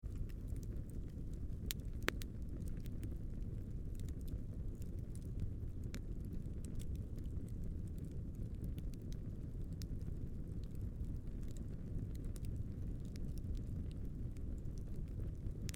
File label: Fire Campfire Lite Crackles Snaps; War; Big Room Sound